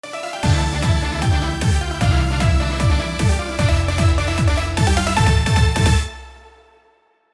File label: Win Theme